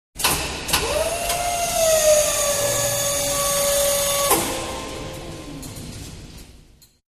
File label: Elevator Servo Motor